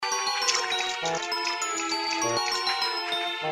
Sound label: water stepps; gamesoundopedia